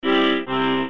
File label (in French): accordeon